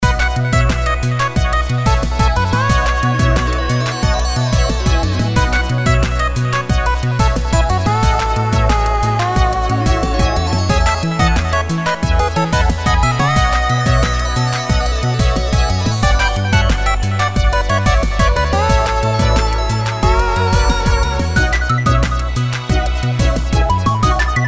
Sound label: Theme Victory; Nexomon